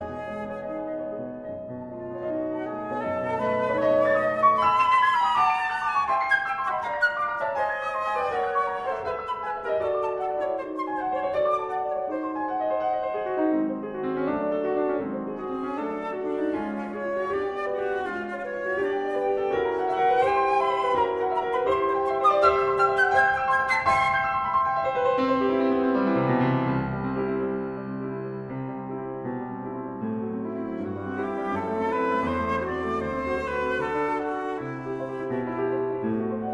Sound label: scherzo final for flute and piano allegro